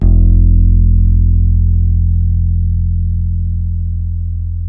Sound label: H. FLS F#2, Bass; Spectrasonics; Bass Legends